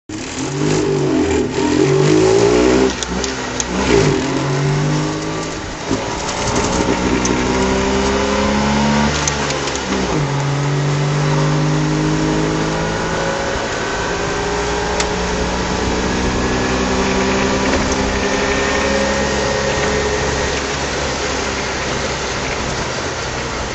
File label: saab 96 2 stroke driving